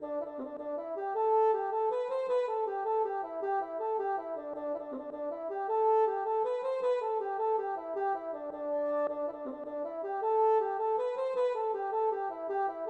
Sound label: jig